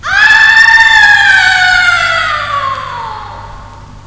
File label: terrible, scary